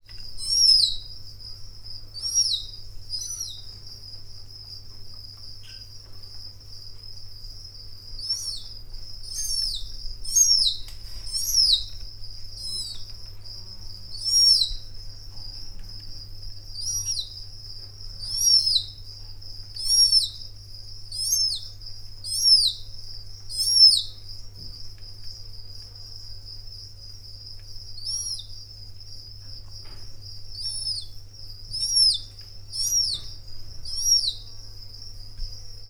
hét hónapos fióka tücsök, barna rétihéja; miskolci zoo 2009

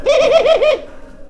hangja, kacagó gerle; parasztudvar

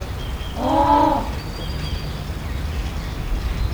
egymagaban, papaszemespingvin; debreceni zoo 2012; marantz puska

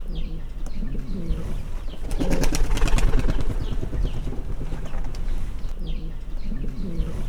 felszálló galamb; parasztudvar

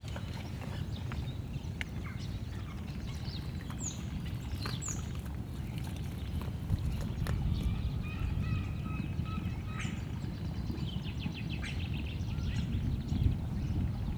sarban tapicskolnak, szurkefarkas; hortobagyi nemzeti park 2013

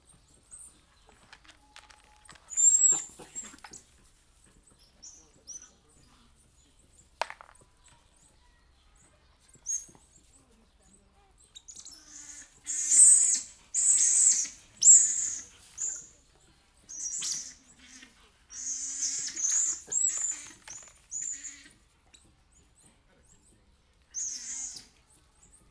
a legerosebb vedi a kajajat, ormanyos medve, allathangok, zoo; debreceni zoo 2019

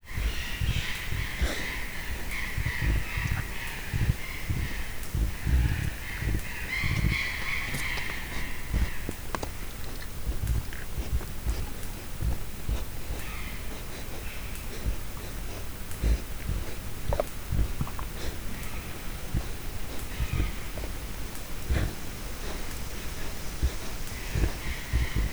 esznek arrébb szaladnak, szél villanypásztor, orvos pékari; miskolci zoo 2009

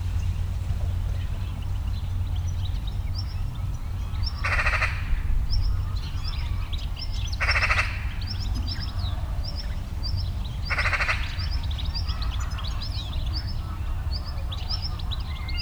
balról varjú galamb is; hortobagyi nemzeti park 2013

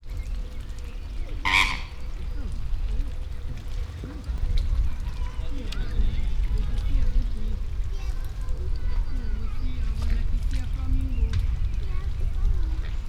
egyenlő időközökben, szél, rózsás flamingó, chilei flamingó; veszpremi zoo 2013

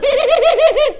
nevetés, kacagó gerle; parasztudvar

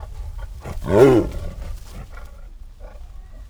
közelről auuu, bengáli tigris; miskolci zoo 2009

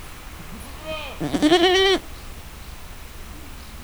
kecske párbeszéd szélben; parasztudvar; hazikecske

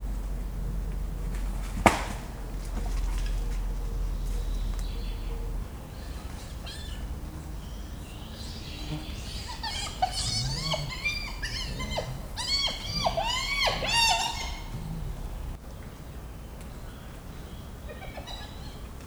esti etetes kacaj, foltos hiena, allathangok, zoo

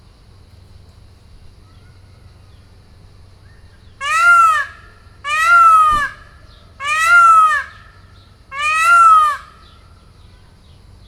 távoli zörej, páva; makryammos beach; thassos

